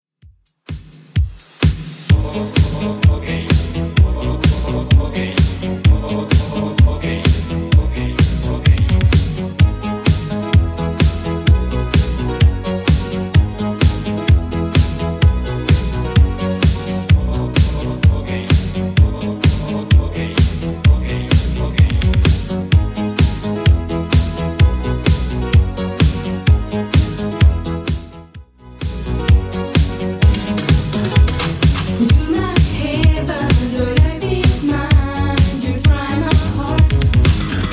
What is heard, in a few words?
80s Redrum 128bpm